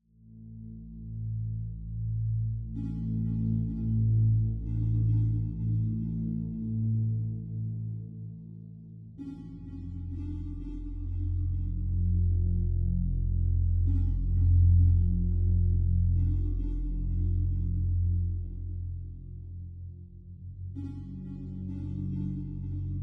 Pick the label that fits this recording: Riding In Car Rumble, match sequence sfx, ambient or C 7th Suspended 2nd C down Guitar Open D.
ambient